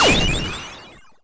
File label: odd, explosions